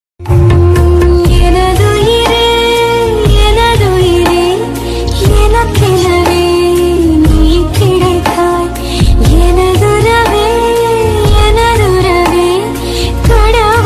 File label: song ringtone tamil love ringtone; vikram; thrisha; bheema movie